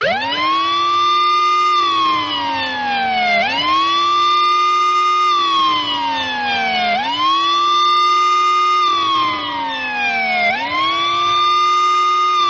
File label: Car Siren Horror; Silent Hill Downpour